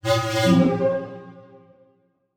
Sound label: Transition Musical Tone Warble; Production Element; Title Transition; AdobeCC